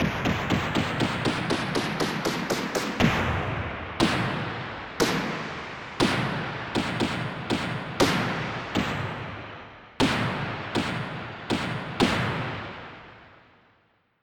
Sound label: gunshot